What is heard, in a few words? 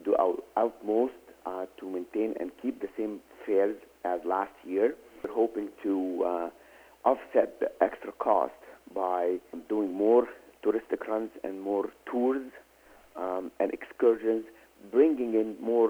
shaker